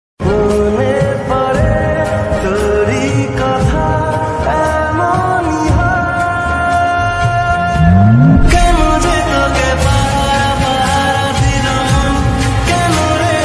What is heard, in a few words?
Bengali Ringtone